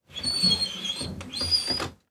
Transportation Boat Engine Handles Move

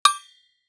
Impact Propane Tank Hit By Small Metal Pole; Impacts

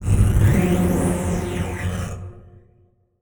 Title Transition Communication Distortion Jammer; Production Element Title Transition

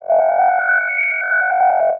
Science Fiction Sci-Fi Electronic Scanner

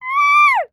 Animal Mammal Carnivore Cat Puma Scream; animals; AdobeCC

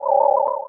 Science Fiction Sci-Fi Electronic Radar Sweep

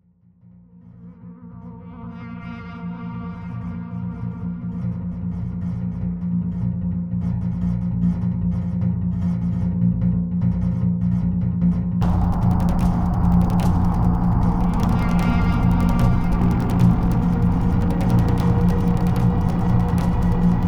Epic 150BPM, Cinematic Trailer Music; BlueFX; Epic Soundtracks

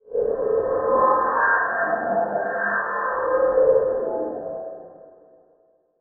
Transition Metallic Drone; Production Element; Title Transition; AdobeCC

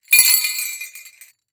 Foley Bell Jingle

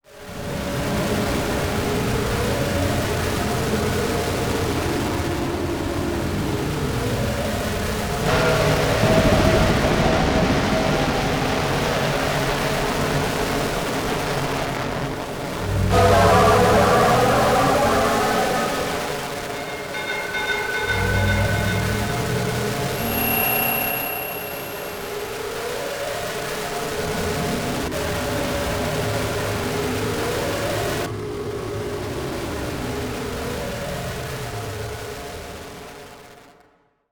Distortion Random Static Pulses; Module Production Element Title Imaging; Imaging Elements

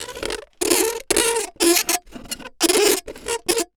Foley Plastic Tube Scrape Wood

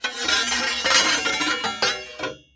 Impact Spade Drag On Boardwalk

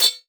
Multimedia Internet CD-Rom Flash Ding

